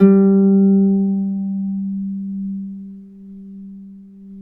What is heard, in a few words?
G2 HARP; Complete Harps; USB Soundscan vol.62; AKAI